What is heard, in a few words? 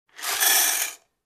Filling cereals in bowl